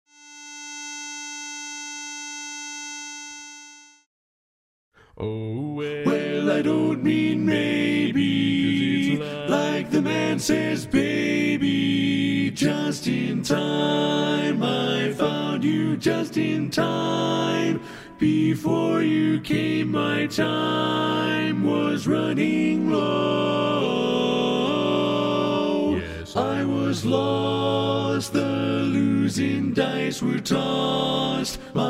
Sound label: Tenor